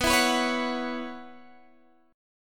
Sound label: F sharp 7th Suspended 4th